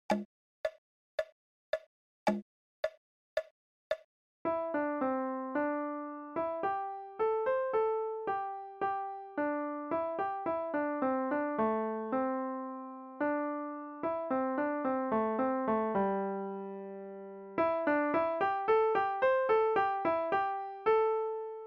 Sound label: Chinese Folk Song RH